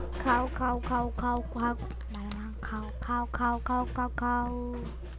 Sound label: cow